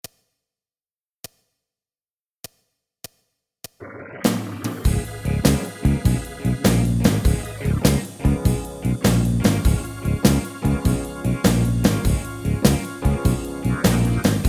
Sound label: alto, saxophone